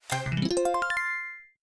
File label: right answer effect